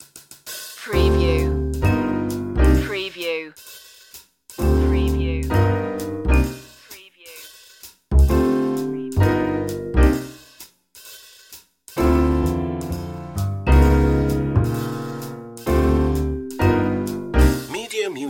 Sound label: fresh jazz